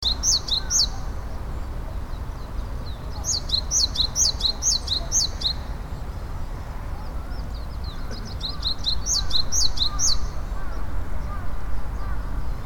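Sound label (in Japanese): shijyuukara, Japanese tit; tatukuti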